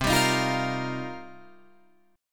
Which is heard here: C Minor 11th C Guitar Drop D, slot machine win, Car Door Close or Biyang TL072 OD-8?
C Minor 11th C Guitar Drop D